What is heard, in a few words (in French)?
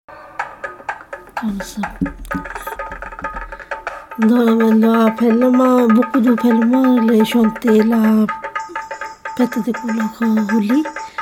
Chant Musique Fete; ABBAYE